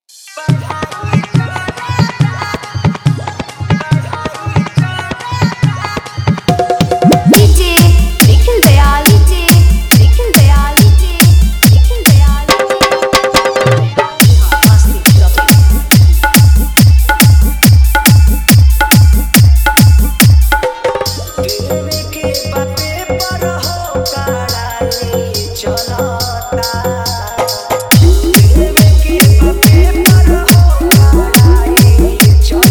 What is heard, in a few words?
Insta Viral Dj Song